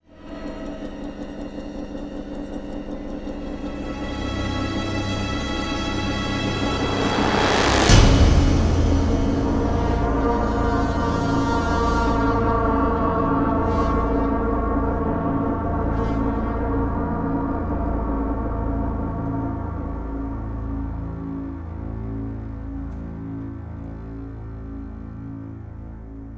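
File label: sting, long end; transformers war for cybertron gamerip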